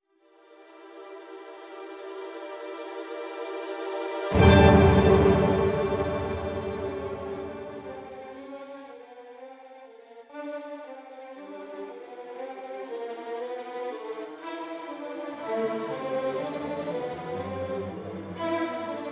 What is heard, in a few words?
tremolo accent, String; Halion String Edition